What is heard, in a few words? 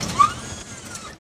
SpeedBrakeDeploy